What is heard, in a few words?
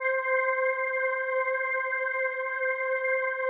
PAD, DIGI-PAD